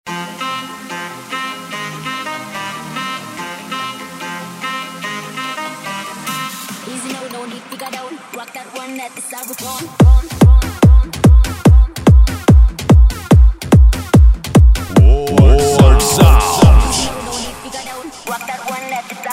pancadao automotivo

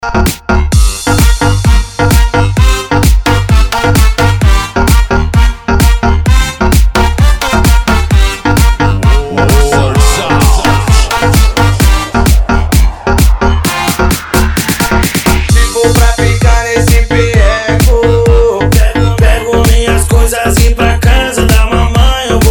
eletro funk pancadao

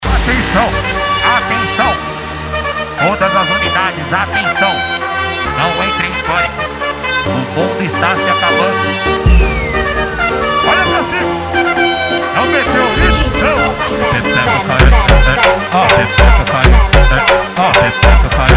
funk automotivo

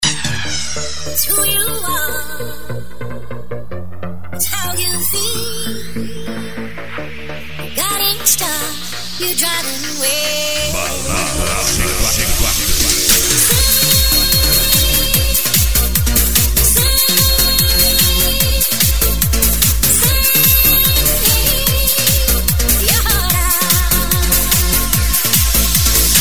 musica eletronica

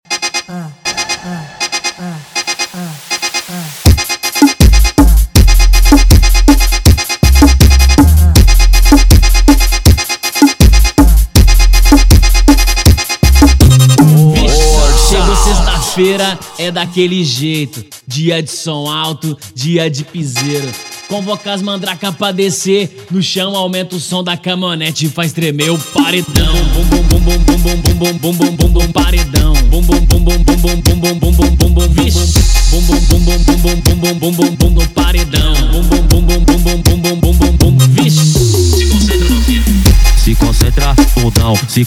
funk remix

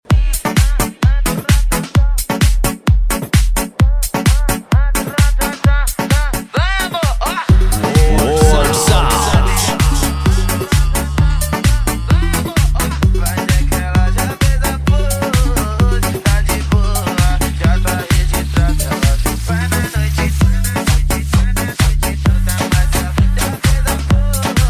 eletro funk remix

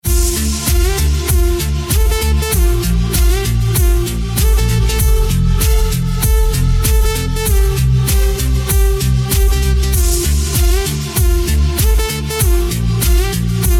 sertanejo remix

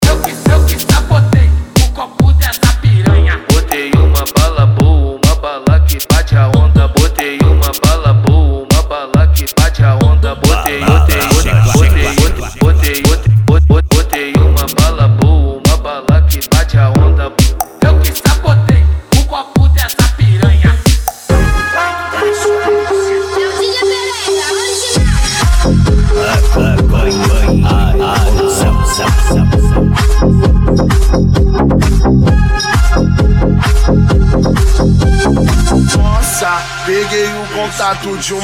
eletro funk automotivo

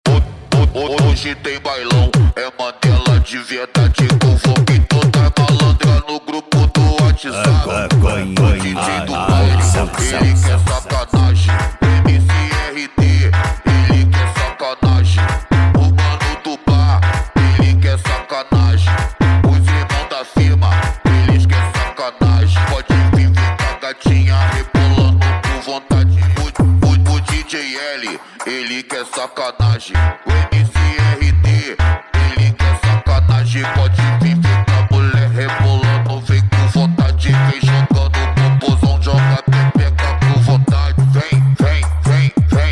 funk automotivo

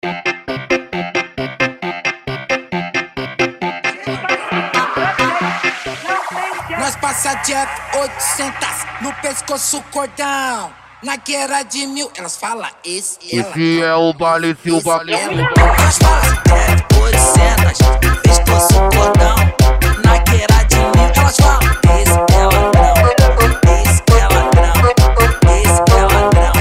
remix eletro funk

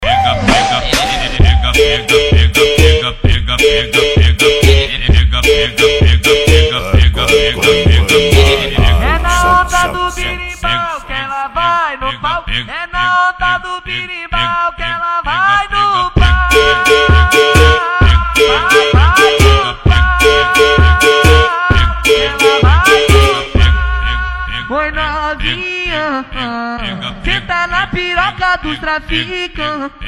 funk remix

